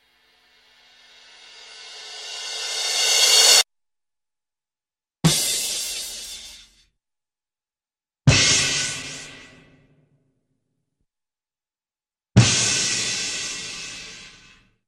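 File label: Drum with Cymbal Crash; Hollywood Edge Cartoon Trax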